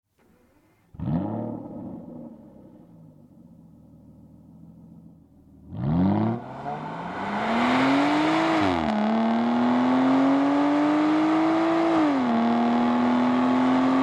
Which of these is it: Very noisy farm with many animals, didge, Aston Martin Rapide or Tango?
Aston Martin Rapide